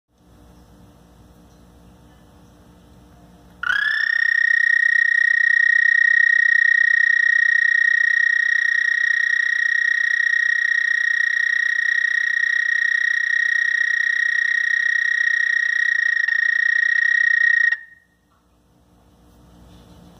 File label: houston toad call